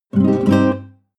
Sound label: nylon guitar chord full major flamenco short